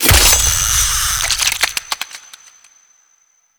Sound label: Fishing Spear Fish Caught; Warframe; Eidolon Fishing Spears